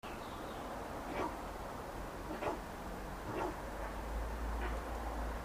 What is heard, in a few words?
Northern bald ibis; پرنده اکراس سرتاس شمالی